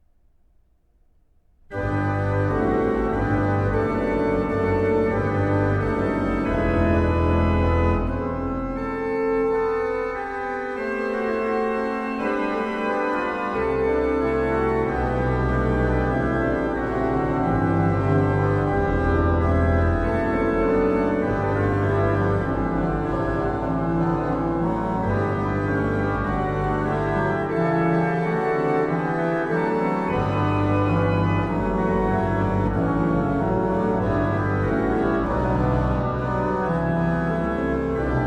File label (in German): Aus tiefer Not schrei ich zu dir, Organ; Bach; The Organ Works; BWV 1099